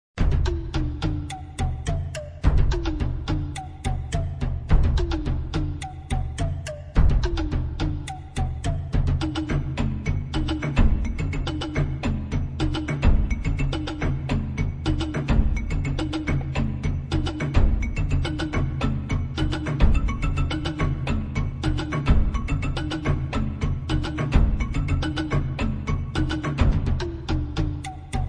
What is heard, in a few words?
Taiko